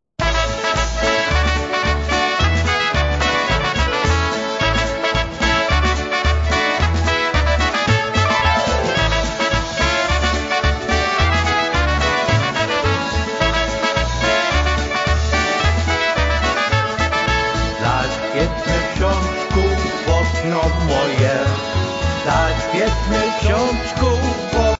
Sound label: POLKA